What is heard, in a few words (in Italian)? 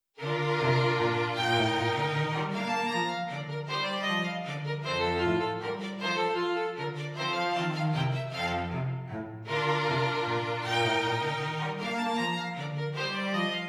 Minuetto in si bemolle maggiore per quartetto d archi; versione Gerd Prengel